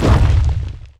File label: attack golem, towers